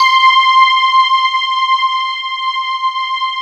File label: JV HUFF N ST; Syntec; Wall of Sounds